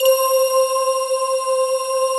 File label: METALITES, pads; Pro-Rec - Synthsonic